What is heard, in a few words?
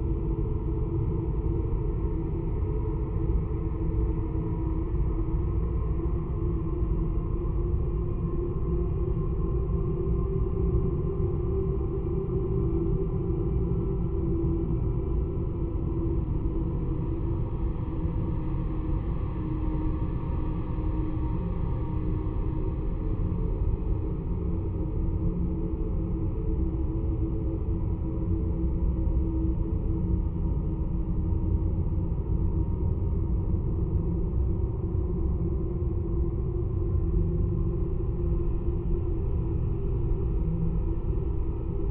cave default, music ambience; The Swapper